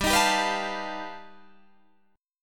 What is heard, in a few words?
G Augmented 9th, Guitar, Open D